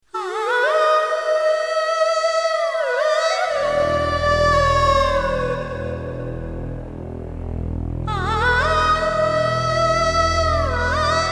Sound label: Music Bit Ringtone